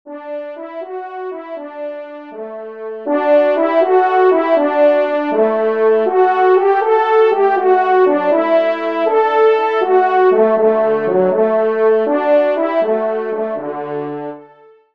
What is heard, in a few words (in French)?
canon, trompe; Loisirs du Chasseur 5